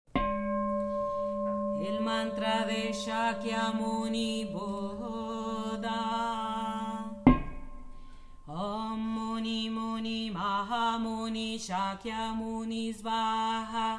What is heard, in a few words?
Mantra Shakyamuni, chants